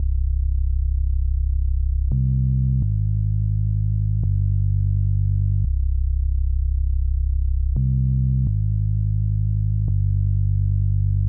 Cmn 170bpm bass; Trench Baby Vol. 5

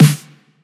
NEON SNARE

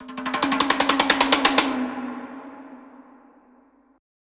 Orchestra Tom Roll

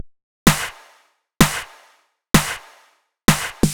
Drum Loops 128bpm Berlin Techno

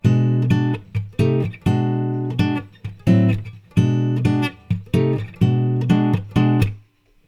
128 B Rhythm Guitar; Anaconda

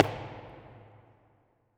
Foot Stomp Foley

